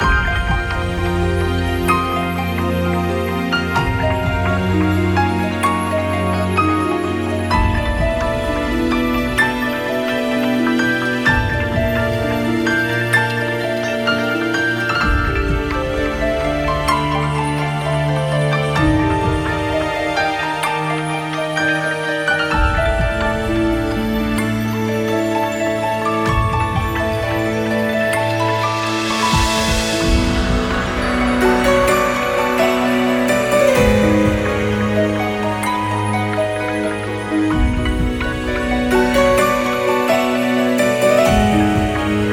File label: Firmament Calm loop